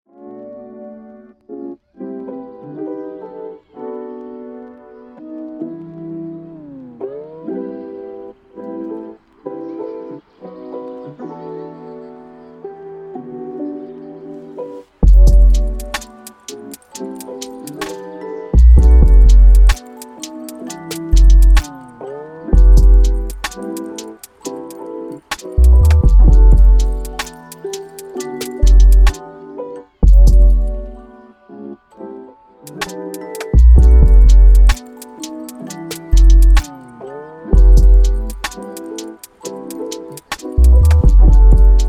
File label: Hip Hop 128BPM